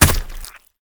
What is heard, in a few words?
Monster Hit; Game rip; MiSide